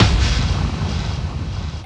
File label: Impacts